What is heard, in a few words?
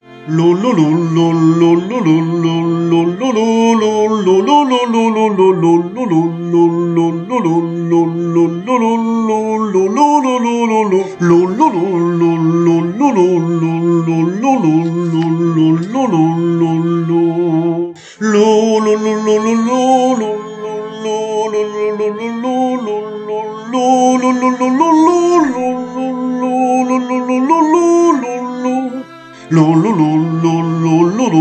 Les 4 Saisons Automne Soprano; Soprani